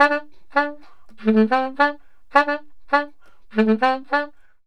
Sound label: SAX, Balkanish Traditions